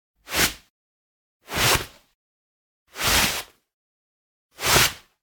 Generic Slide; boom library; The Interface

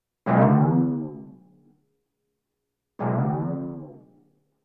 Percussion Bongos Tympani rolls Gongs Drums

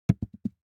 DICE on Felt, Throw and Roll, Foam, 1 One Die; Samples; ArticulatedSounds; DICE Sfx Party (Extended)

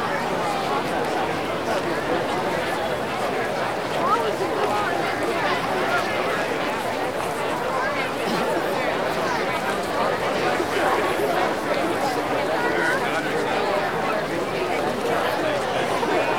crowd plate; Blind Gladiator

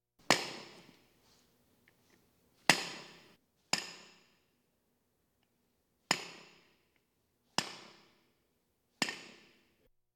Police, Fire engines; Valentino Production Sound Effects Library CD50

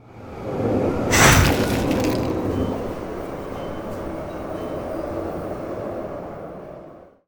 Ice Spell Whoosh, Cold Whooshes; Arctic Aura; Zephyr

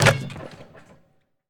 Street Ball Dunk Nylon, Street Basketball; SFX Kit

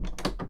door open2, household; NS Studios - ultra sound collection

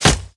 Ranged Physical Medium; Kilta

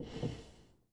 Foosball Goalie Slide

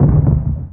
Explo AAA Flak; Destruction

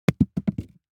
DICE on Felt, Throw and Roll, Foam, 2 Two Dice; Samples; ArticulatedSounds; DICE Sfx Party (Extended)

